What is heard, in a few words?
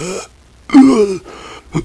gasp